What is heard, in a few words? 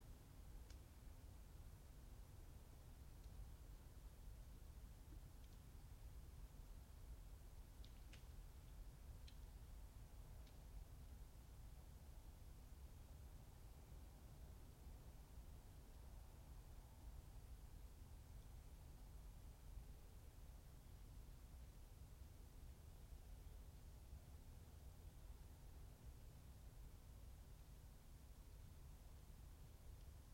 yamanakako sound